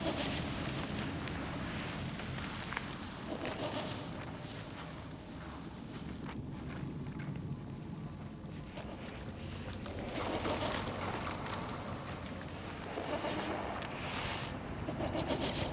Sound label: atmos lifeblood wormways; Hollow Knight: Silksong